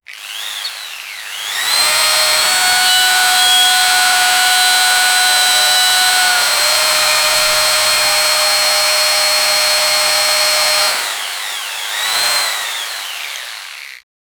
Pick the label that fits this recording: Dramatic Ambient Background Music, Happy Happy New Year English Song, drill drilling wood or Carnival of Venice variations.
drill drilling wood